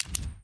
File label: Change Flashlight